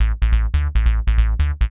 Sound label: TECHNO BASS 140 A